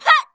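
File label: MON ATTACK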